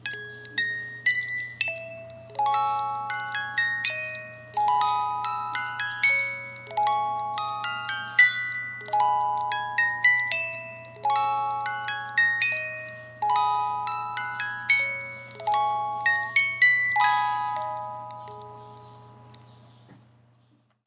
music box; SAMPLED MUSIC

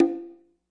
classic hh Bngo; STYLE KITS (Hip Hop, Etc); Classic Hip Hop Mega Kit

